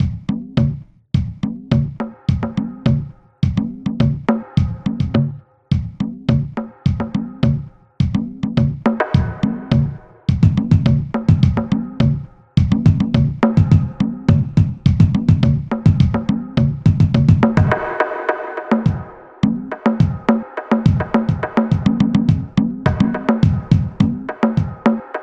Wind Drums; gamerip; The Undergarden; xbla xbox 360

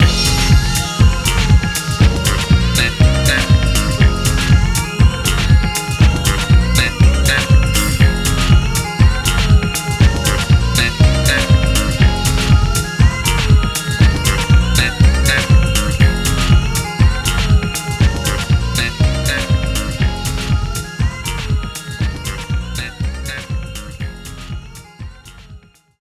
Opponent Down; Disco Kid

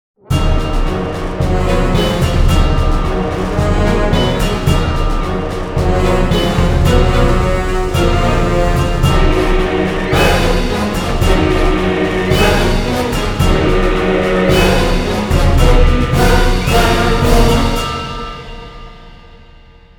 Battle Important Stinger

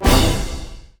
Jingle, Invasion Failed; rayman legends the complete soundtrack gamerip 2013

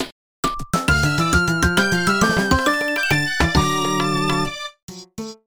Forest Level Complete

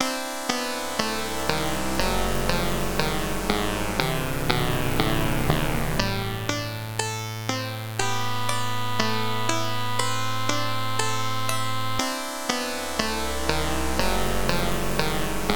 lose theme; X-Men II Fall of the Mutants; IBM PC AT

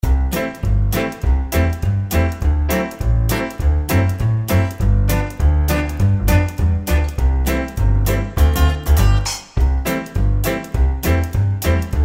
Bearbershop Loop; battle bears 1

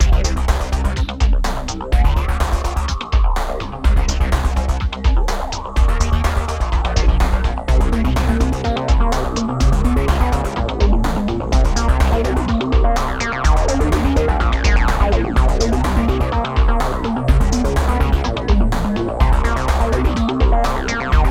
Boss Intro Loop; mokoko x

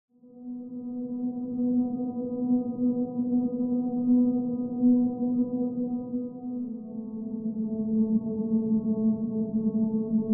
Pad Only Loop; The Last of Us

